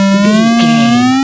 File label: retro synth wobble